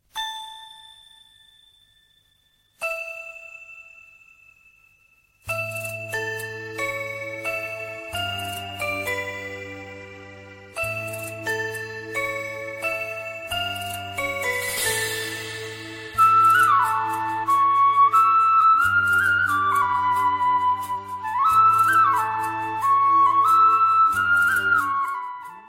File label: Sound Track